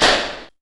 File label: SHOT TUNNEL; 3D Sound - 3D Keys VOL-1; MEAN 3D